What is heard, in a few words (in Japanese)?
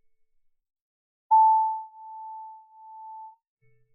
A 440 第二倍音 piano